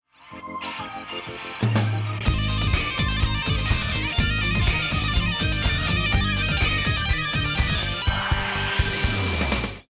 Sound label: round ten sec count; music kits custom